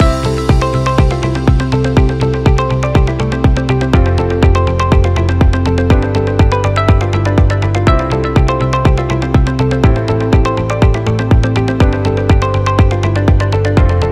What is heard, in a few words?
Happy Corporate Loop